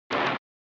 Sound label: Racing Sands; SNES